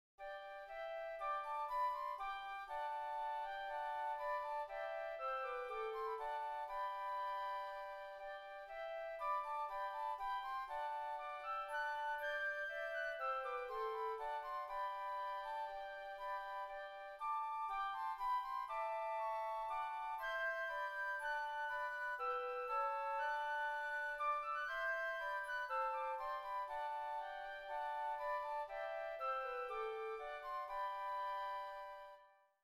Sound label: Hymn Flutes